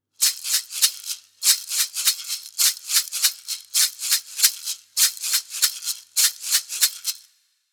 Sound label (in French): impro maracas; loto sonore